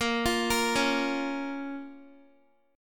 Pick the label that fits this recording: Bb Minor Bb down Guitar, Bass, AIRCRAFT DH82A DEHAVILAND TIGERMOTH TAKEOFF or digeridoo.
Bb Minor Bb down Guitar